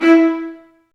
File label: viola marcato, String Sections; Violas Marc; Vas2 Marcato; Roland LCDP13